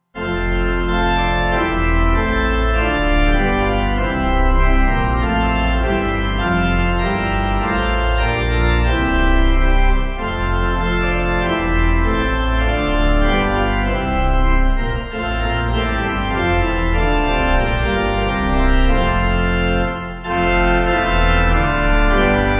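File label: Pipe Organ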